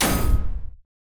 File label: machine hit